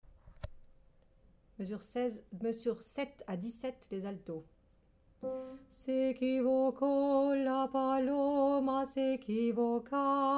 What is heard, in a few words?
paloma Alto